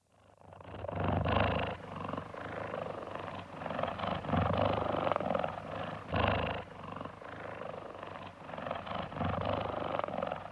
Cheetahs, close up purring from several animals, with some movement sounds; Big Cat Sound Effects; BBC